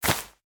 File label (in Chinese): 藤蔓攀爬声